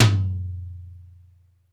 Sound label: DRY T, drum; Crypton - DrumBase5000; DRY KITS